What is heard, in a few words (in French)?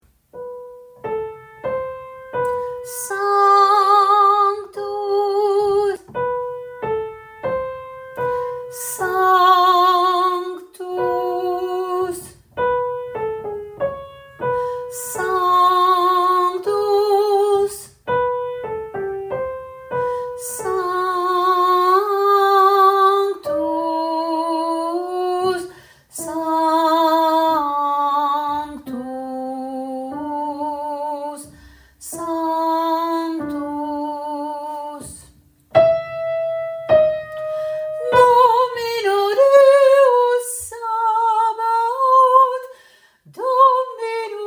sanctus voix 2